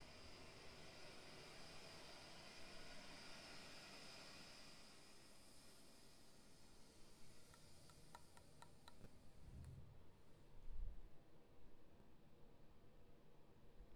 Water in Pipes

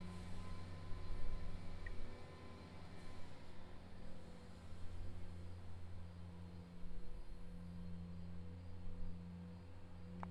Lawn Mower Ambience; West Hall

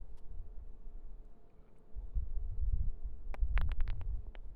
Rock On Concrete; fieldrecordings

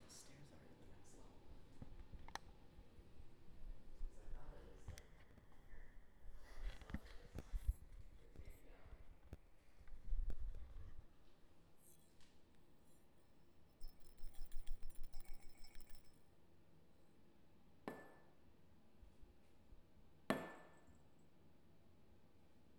Sounds of an abandoned room

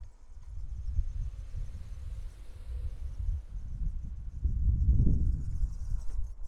Vehicle Driveby; fieldrecordings

